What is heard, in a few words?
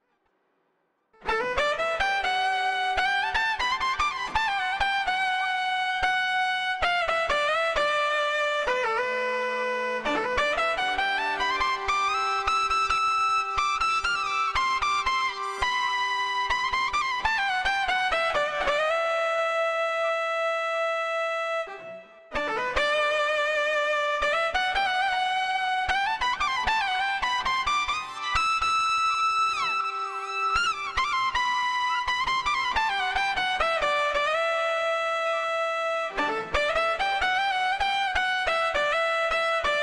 GR 300 Lead